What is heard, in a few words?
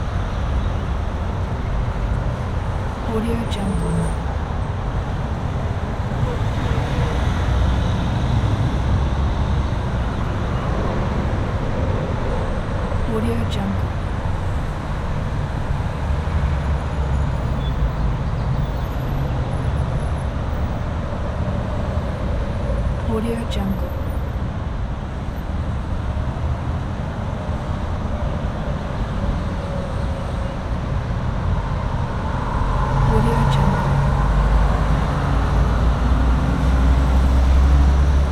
freeway overpass